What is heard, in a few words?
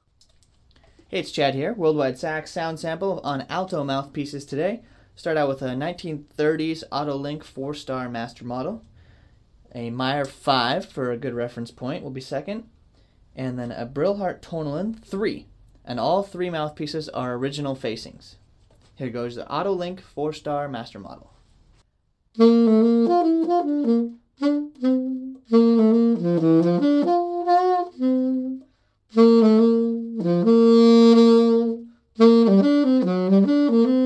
ALTO mpc Link 4 star Meyer 5 Tonal